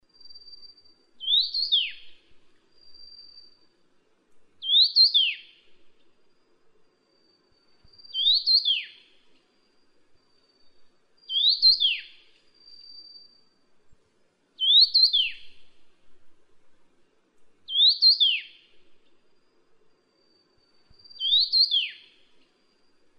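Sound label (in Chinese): 冠羽畫眉, Yushan National Park; 畫眉科/留鳥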